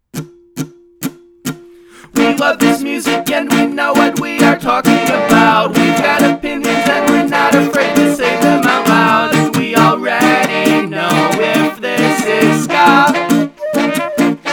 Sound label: Theme Horns; HornPod